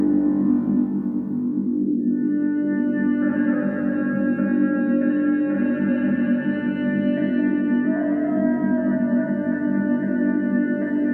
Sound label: Music Loop; Insurgency 2